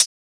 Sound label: Closed Hat